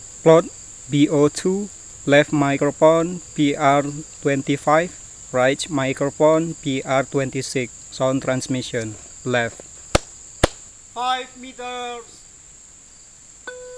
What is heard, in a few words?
birds